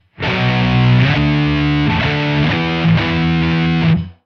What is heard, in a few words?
Chords; TAB; guitar